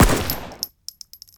heavygun

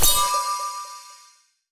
heal

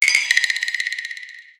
lantern cast

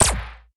atk funnel

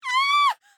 panic woman

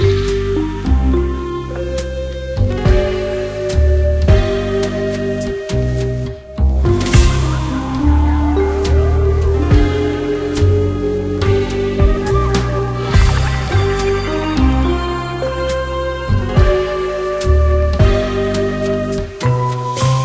avg elegance loop, music

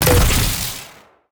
grenade

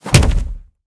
slam stone table

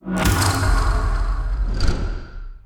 battle draw

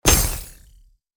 electric stick

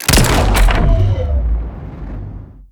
gun

